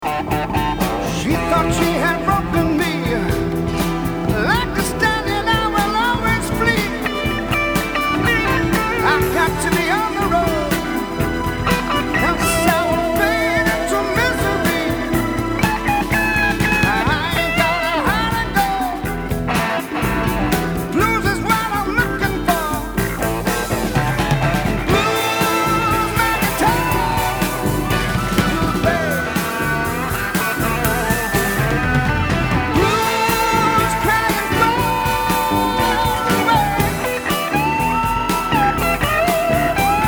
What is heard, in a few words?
blue my guitar vocal